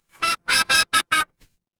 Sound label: cartoon squeak